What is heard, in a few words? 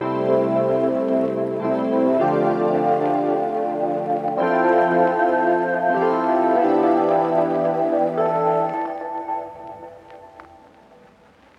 c6 dmadd11 fmaj9 em7add11